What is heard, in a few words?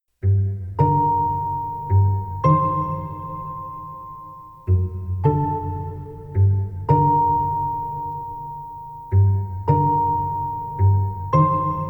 Across the Balcony (Celesta Vers.); Finding Paradise Soundtrack